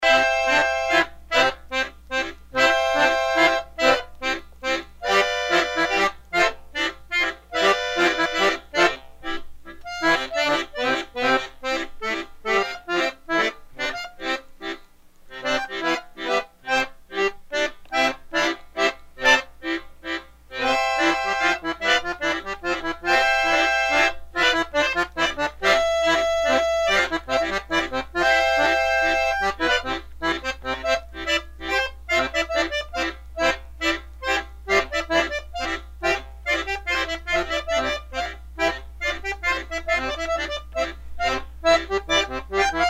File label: FOUR HANDED CACOPHONY